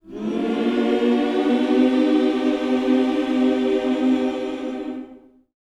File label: MINOR, MIN.SCOOPS, Vocal Planet, Gospel; Vocal Planet Vol1 Gospel